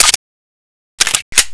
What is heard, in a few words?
mac10 bolt pull, weapons; Glock18